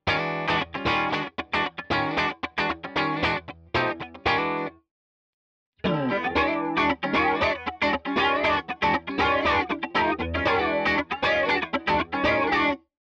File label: Walrus Fundamental PHASER Heavy